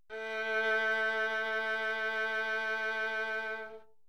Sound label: cello